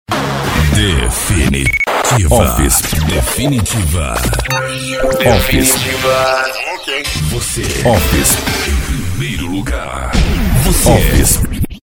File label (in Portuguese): vinheta